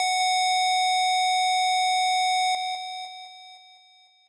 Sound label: lead